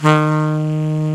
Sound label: SAX A. MP D, Alto Short